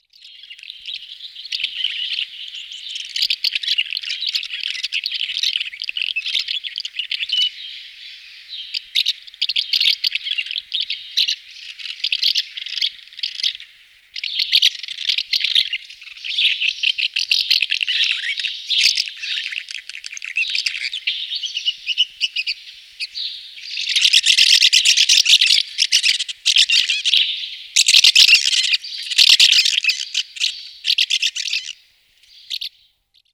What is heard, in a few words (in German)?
Mehlschwalbe, Voegel